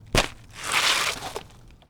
impact gritty grit sandy dirt dig shovel shingle roof; Debris; Matt Script; You Me & Debris